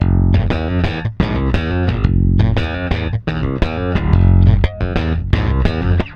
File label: PWR G, Bass, PWRSTRUM; Spectrasonics; Bass Legends; 128 PWRSTRUM